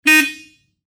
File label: 50 packard hooting